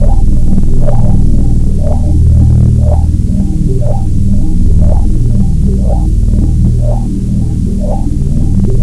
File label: lifts; tfc